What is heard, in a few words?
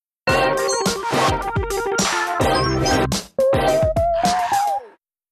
Funk; LG GD570AW dLite; RingTone